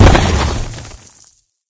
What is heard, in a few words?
stalactite hit; video games; ambient; source engine; portal2; outland